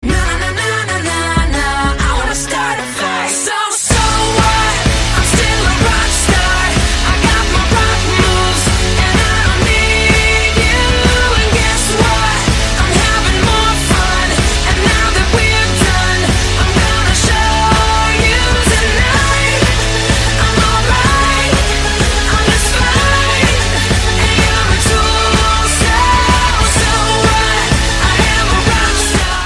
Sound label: ringtone